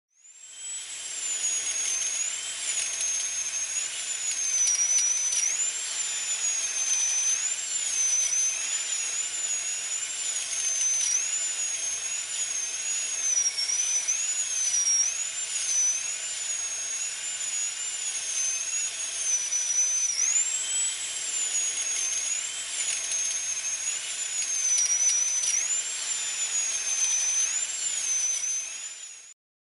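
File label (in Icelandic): borhljóð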